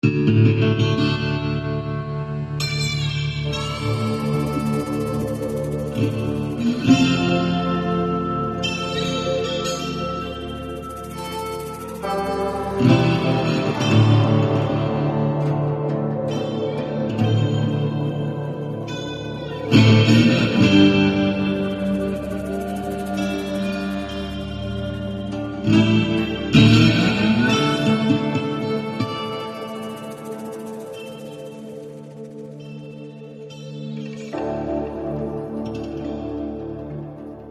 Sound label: Linear Ambient